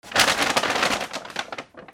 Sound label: PAPER